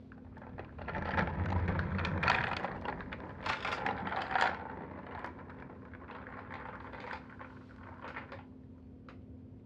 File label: Cart Roll